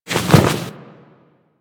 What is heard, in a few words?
Misc Foley; Warframe; Tenno